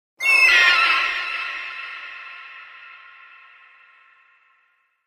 Hawk Eerie Call 4; animals

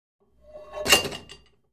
Free Weights Exerci

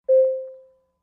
Aircraft Bell Announ, warning signals

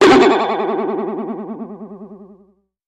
Boing Box Vibrato

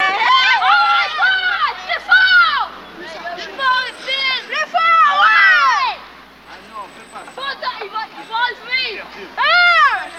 Children French Play, kids play

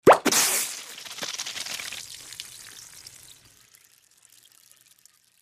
Champagne Bottle Pop

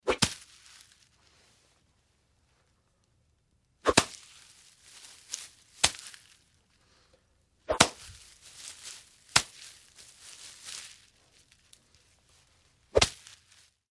Machete Hack Jungle

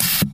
hydraulic hiss

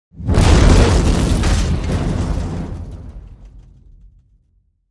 Medium Explosion, metal & glass

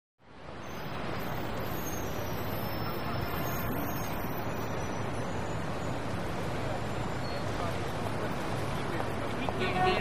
Busy Intersection Me; big city

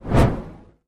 Torch Movement; torches

